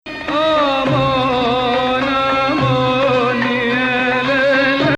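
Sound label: Pseudo Sikah, Arabic music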